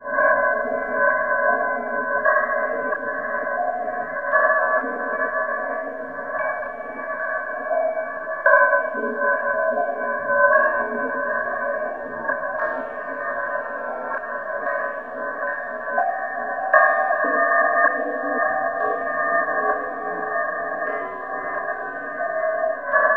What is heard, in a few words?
BELL ATMOS; Zero G; Malice In Wonderland